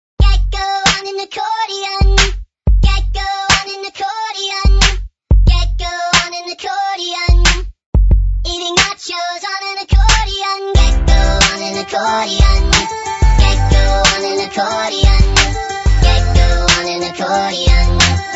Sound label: Gecko on an Accordion